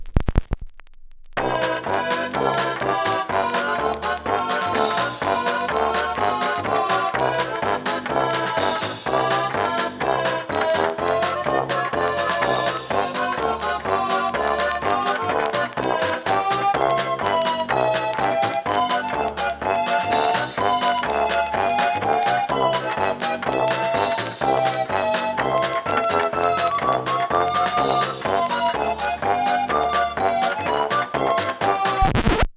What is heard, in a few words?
Accordion; Jackbox Party Pack 3